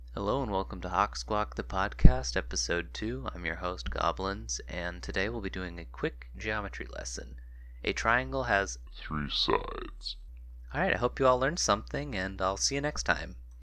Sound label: triangle